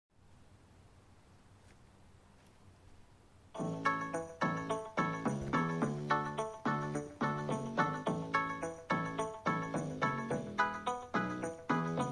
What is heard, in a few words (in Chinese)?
通用bgm; JumpGame